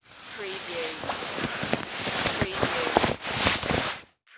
Sleeping Bag Movement; sound effects bible

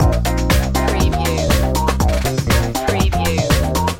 fonk loop keys

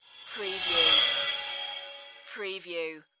Whoosh Flange Fast

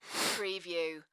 Sniff Single; sound effects bible

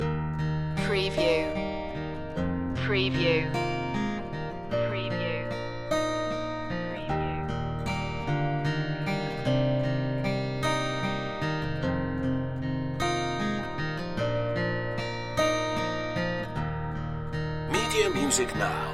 loop

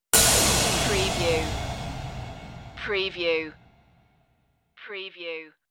pop tv stinger

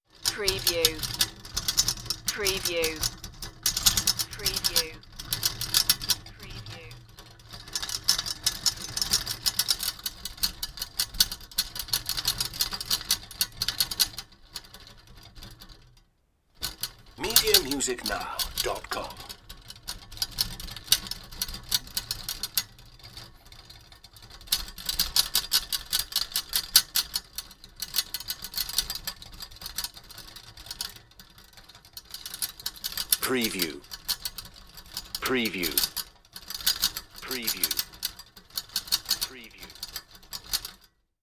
rattling metal

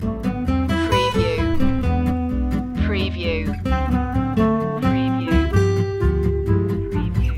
ragtime loop

